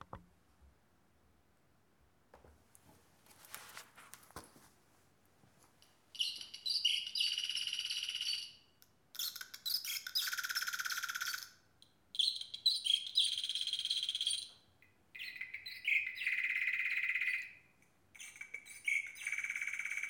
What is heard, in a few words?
piezo birds